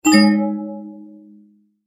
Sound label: multimedia tone end